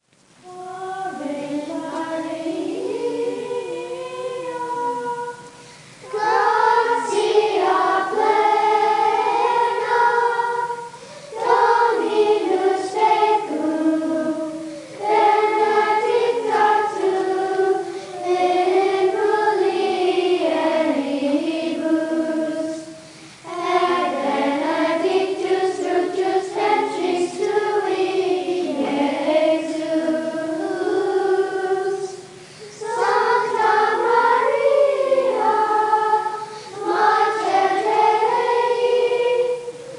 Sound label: Ave Maria Gregorian Chant